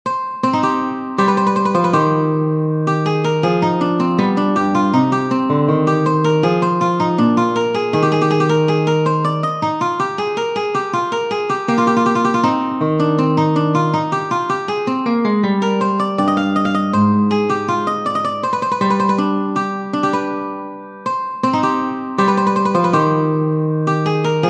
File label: allemande minor for classical guitar